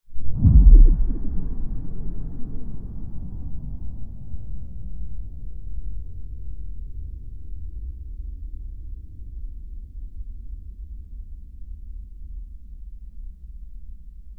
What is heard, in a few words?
crunch woomph